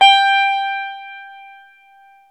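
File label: guitar electric 12 string; Club-50 - Foundations; E.12 String; Ricky12Str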